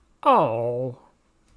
Disappointed Aww

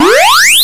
Power Boost

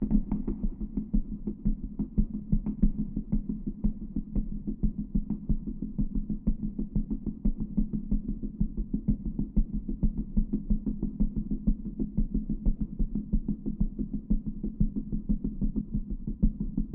Drum Loop

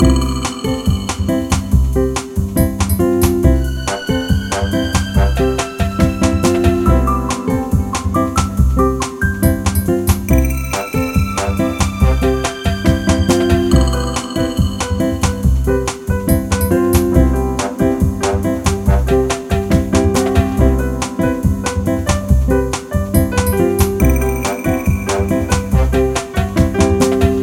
Latin Jazz Loop

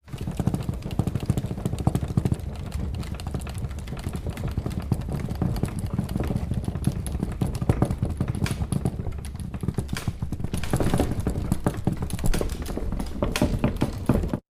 Car Squeak Chair Extreme Low Rumble Vibrate

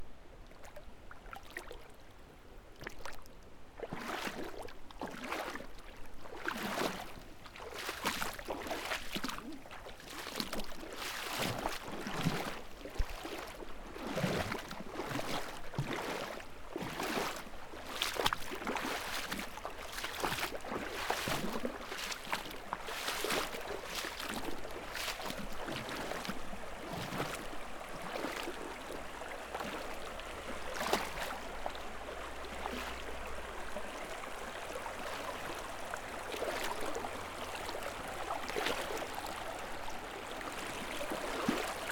Walking In A River

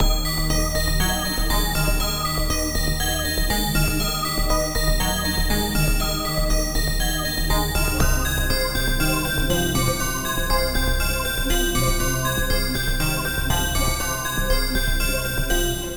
Horror Bells

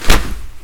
Hit

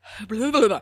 Tongue Twisted

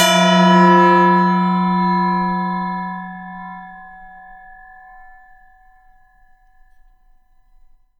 Clang

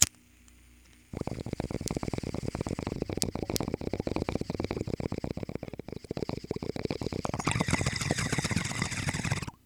Bong hit

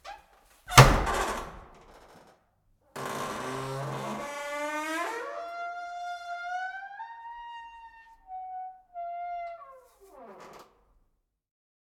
Door wood Old Wooden Entrance Door Creak And Hits

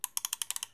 Wind Up

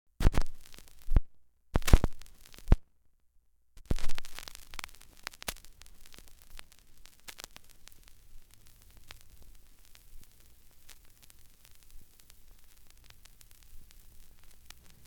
Record Vinyl Needle Drop Start Scratches Crackle

